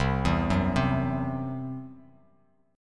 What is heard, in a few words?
C-Major Flat 5th, Bass Standard; arpeggiated